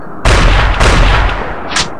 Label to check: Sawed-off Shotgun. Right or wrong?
Right